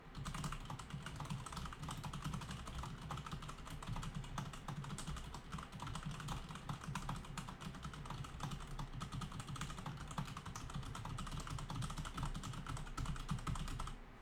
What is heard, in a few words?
cherry mx brown oring mod